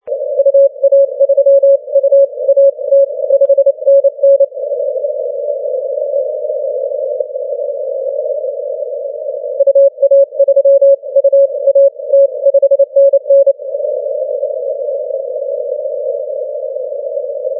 30m cw

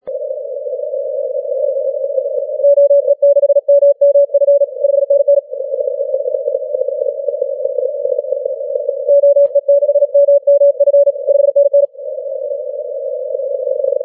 20m cw

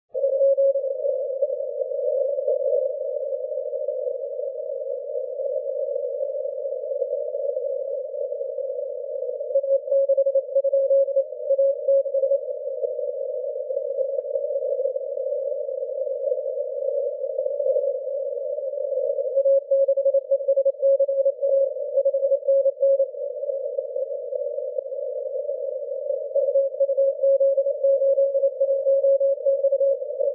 cw; 80m

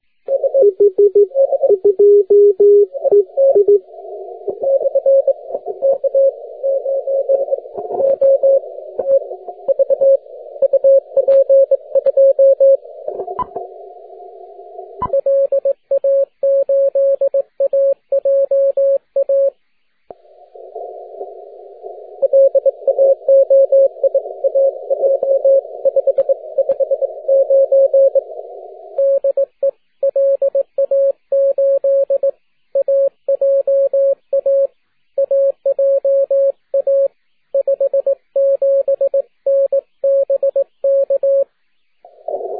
30m cw

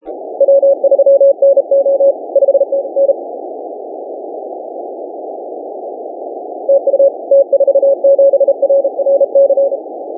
17m cw

